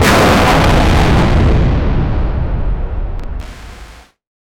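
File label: explosive crack of unstable, energy burst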